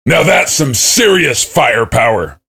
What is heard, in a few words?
cheer; svencoop; voice commands; SeriousSam